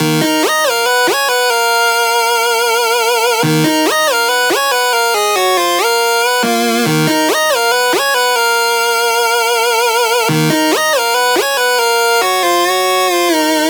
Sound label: Riddim Melodic Loop D# 140; New Challenger; Ghosthack; Riddim Essentials Volume 2